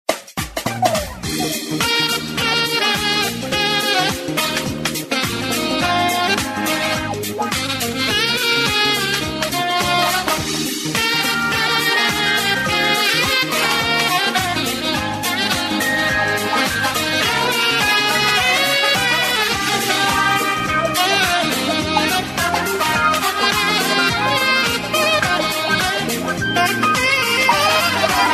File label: midi ring; LG enV2